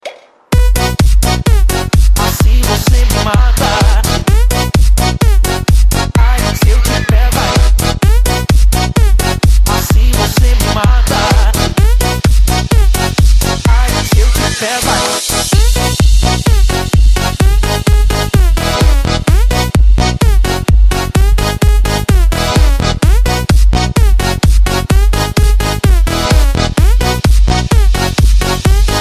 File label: techno remix